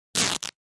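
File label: Cloth Split Rip Tear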